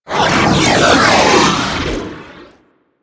Fighter Ship Attack Maneuver, Space Fighter Ship Engine; Vehicles; Warframe; Rail Jack; Rail Jack Enemy Ships; Corpus